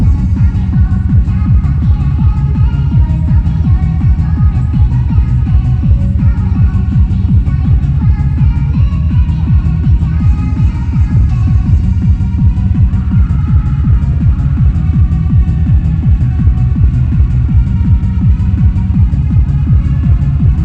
House Loop

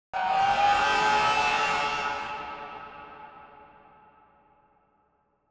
scream

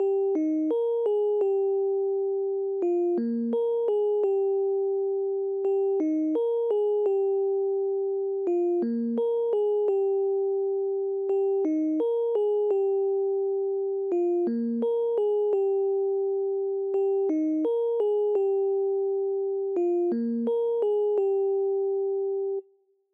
suitcase 170bpm

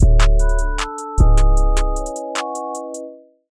beat

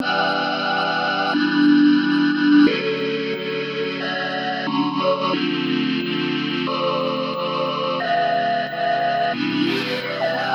vocal synth

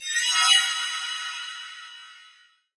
skele notice sound

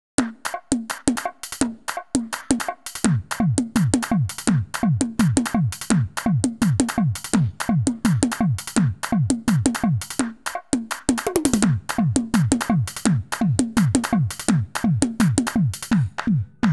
drums; robot 64 lore

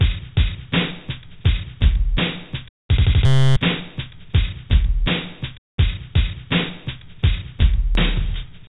perc loop; robot 64 field trip 2006

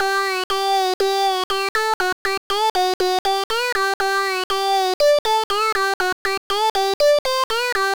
LEAD; Future Bass Essentials Vol.2 - LEAD 20; xd pixel pig

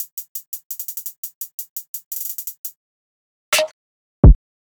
sugar drums 170bpm

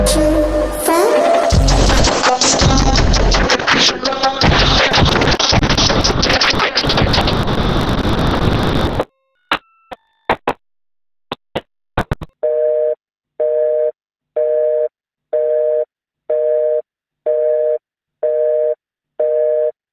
band

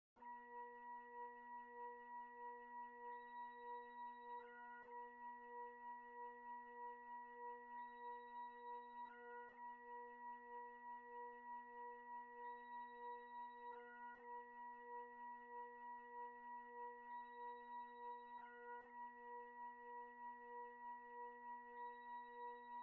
strings; ytpmv

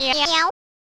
kitty cat

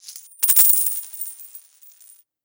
Coins Drop; SFX Producer

